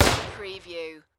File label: Rifle Sig-Sauer SSG